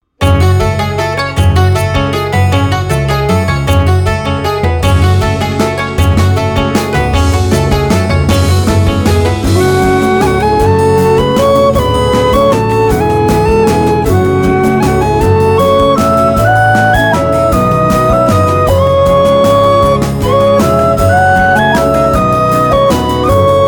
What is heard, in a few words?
Lullaby of Deserted Hell IRISH; k-waves LAB